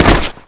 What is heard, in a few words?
body fall cement